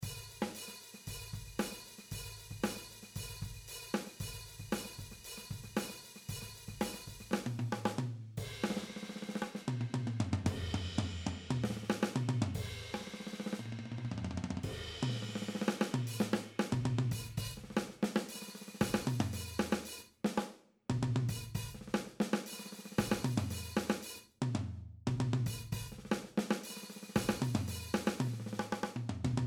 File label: overhead dry